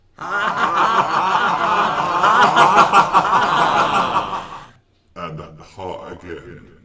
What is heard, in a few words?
laughter